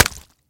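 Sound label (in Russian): безжалостный сильный удар ножом по цели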